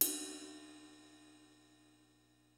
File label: PHAT TRAX KIT, DRUMS; Kid Nepro; Elite